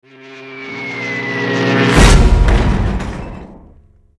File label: drop boat; Toontown Online